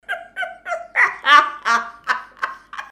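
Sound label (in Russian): звук смеха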